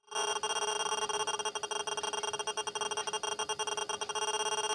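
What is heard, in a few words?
detector piezo; piezzo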